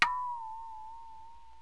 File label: soft wang, cartoon; Wired for Sound Pro CD; Aristosoft, Inc.